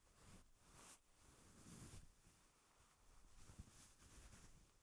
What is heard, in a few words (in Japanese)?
布ズレ